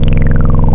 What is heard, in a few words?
Hi Res, wavetables; Animoog